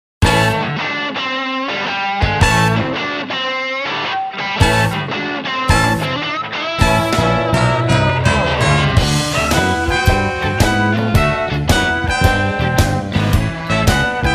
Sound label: Blues, horns